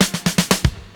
70S DISCO FILL, DRUM FILL; Crypton - DrumBase5000

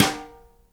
snare GLASS, Live Snares

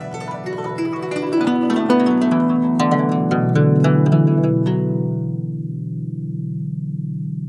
Traditional Guzheng; Continental Drift Aftershocks

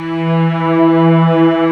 Textural Strings

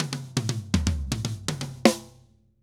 Drums Poly 120, Drums & Percussion

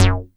MC 202, SEQ-FX; Masterbits; Vintage Voltage

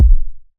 Phase Plant, kick

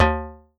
BOTTL; Masterbits - Total Zone; ADD.PERC.

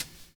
BRUSHING; Univers Sons

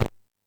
Timbale, E-MU Pro-Cussion

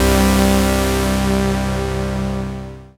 SYN K-2000; Sound & Vision - Gigapack I CD 2

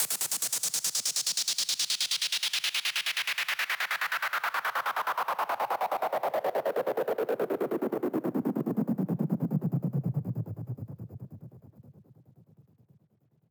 PWM Kit 142 BPM Downfilter; Vengeance - Essential Clubsounds Vol.5; Demo Constr Kits; Root Gmin

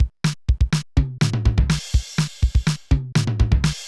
Loop, mxr; classic machines

